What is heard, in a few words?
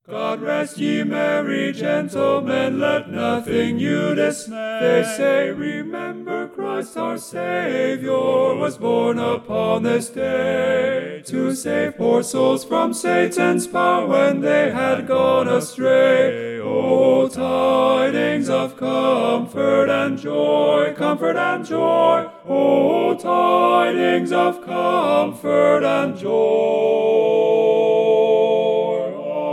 Tenor, tracks ttbb; God Rest Ye Merry Gentlemen